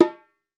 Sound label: REPIQUE; Latin Drums & Rain